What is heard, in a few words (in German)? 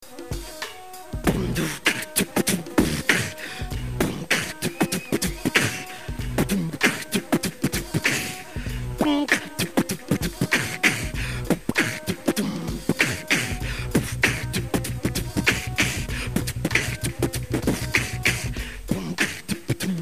Hauptbuehne Beatbox; Hanfparade 2003